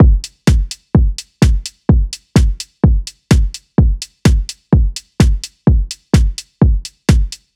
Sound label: beat